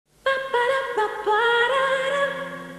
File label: nescafe jingle